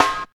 dark sounding steel snare drum f key, snare single hit tuned to the F note; snare-drum-samples